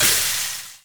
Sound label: Drip Cauldron Molten Sizzle; SFX Kit; Foley - Misc